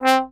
Trombone staccato C3; Orchestral; DirectWave; Varazdin Orchestral

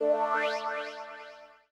SPACE SYNTH 140 A; SpaceSounds

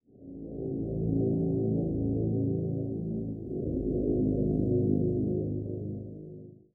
SPACE PAD 140 A; SpaceSounds

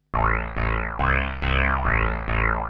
TEKNO 140, Techno; Techno2; Sphere

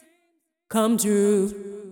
deep house female, 125, A, vocals

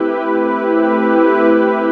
house synth 125 A, keys

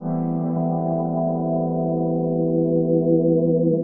DEEPHOUSE ORGAN 125 A

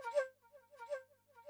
FLUTE ETHNO 160 C

